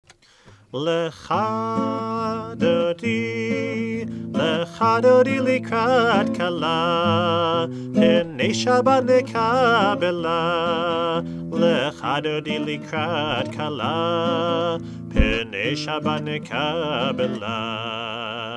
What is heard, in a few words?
Lcha Dodi repeating chorus with last verse